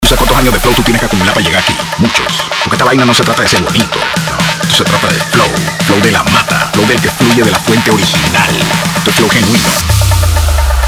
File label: sandungueo 128 BPM